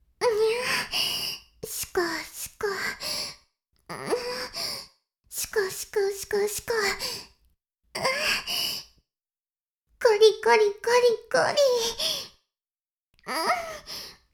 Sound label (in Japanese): しこしこ音声, ASMR; 白くて黒い魔性円環